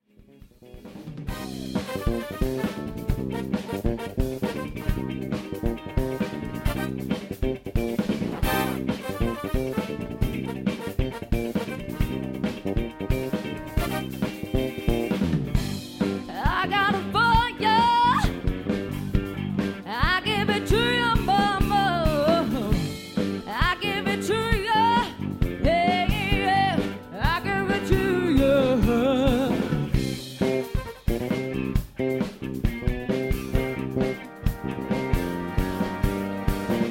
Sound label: some funk